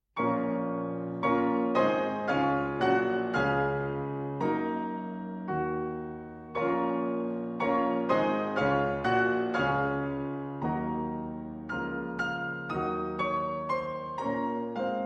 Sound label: Russian Folk Songs; Tchaikovsky 50 Russian Folk Songs, TH 176 - 27. Upon the Sea so B